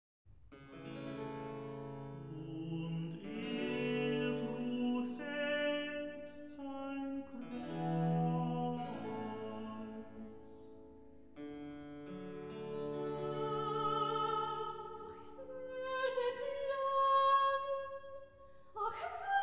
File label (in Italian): Recitativo; Und er trug selbst; Brockes Passion